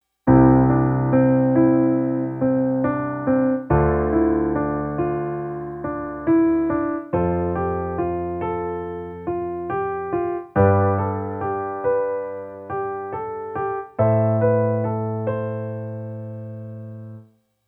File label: add 2 movement